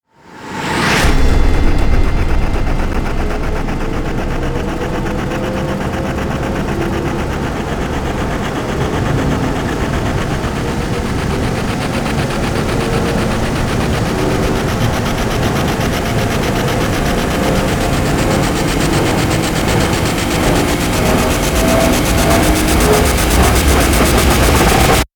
riser airy train

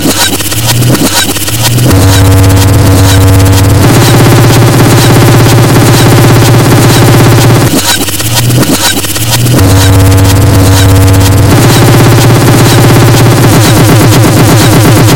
experimental reverse splash

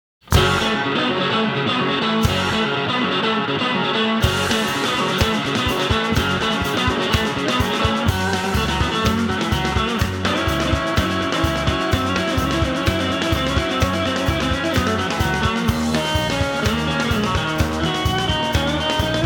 busy guitar

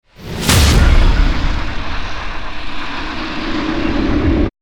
hit big

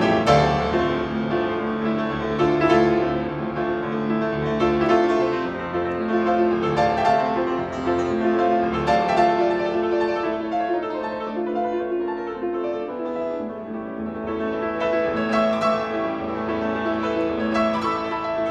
piano sonata no 1 in d moll; sergei rahmaninov; op 28; movement 3 tempo i

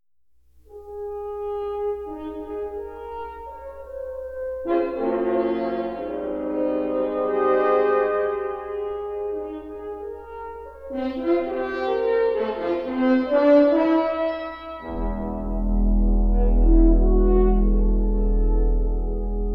fanfare; the ladd company; workprint version

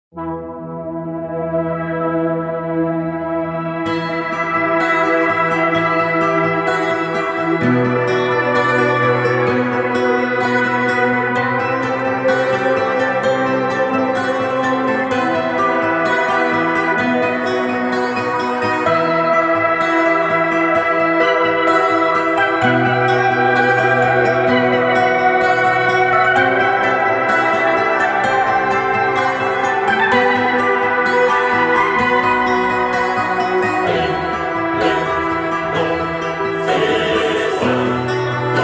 acoustic

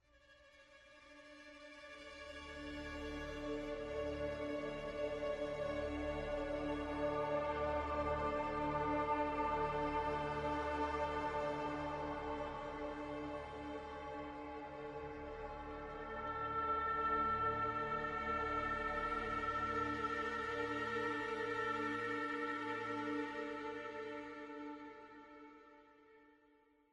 ambient

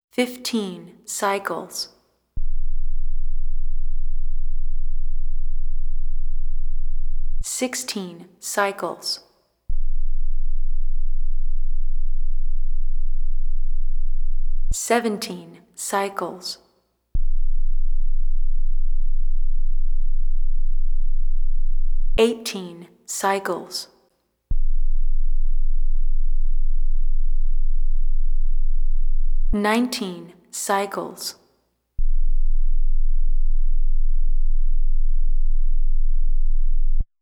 15 19 Hz

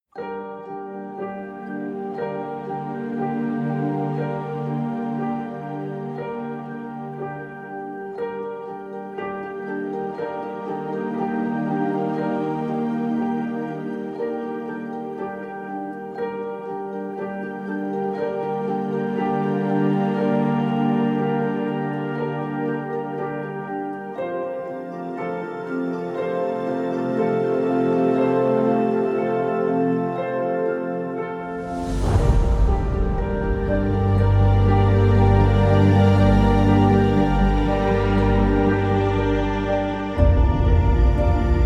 no solo vocal

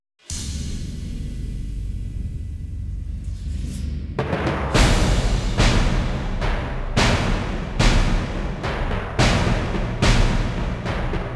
percussion only

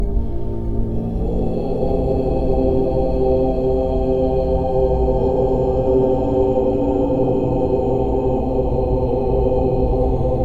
relaxing music with rain sounds for meditation

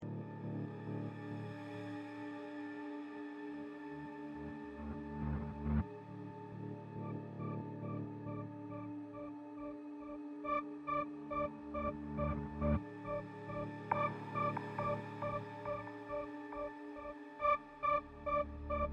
tension one shots; convo systems

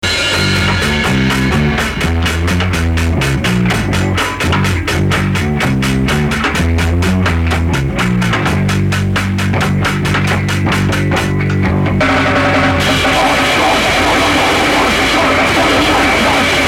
bass groove

